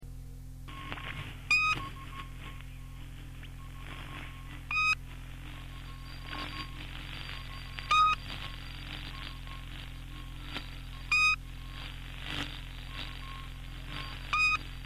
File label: old marker; s28; 4625 khz